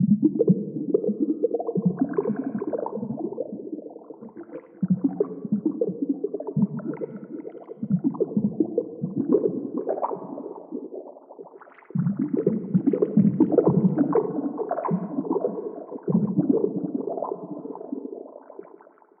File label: Under water; Rialasten 2022 Xmas Sample Pack; 2022 Xmas Packs